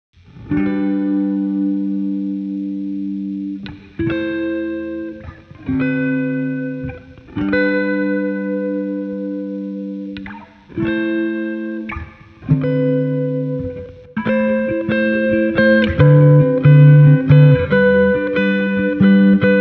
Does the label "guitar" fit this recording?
Yes